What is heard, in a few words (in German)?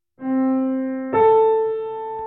Sext groß